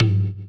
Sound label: EQ Perc, Percussion Mix